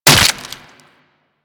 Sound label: Dagger Flesh Impact; Dual Daggers; Hit Effects; Weapons